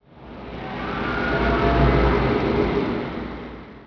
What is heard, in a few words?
amb coaster dist; Tony Hawk's Project 8; XBOX